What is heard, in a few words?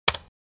Light switch toggle click